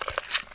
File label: web spin; weapons